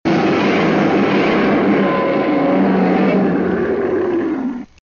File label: godzilla roar; heisei